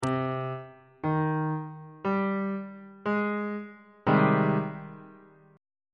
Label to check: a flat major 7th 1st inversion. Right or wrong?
Right